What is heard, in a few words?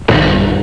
wrong